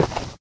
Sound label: hard footstep; nalc game